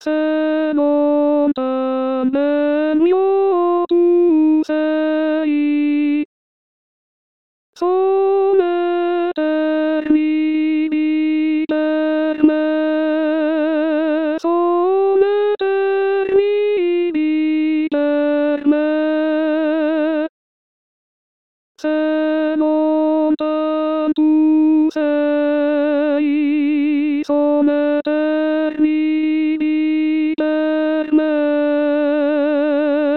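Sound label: alto; Mozart; Se lontan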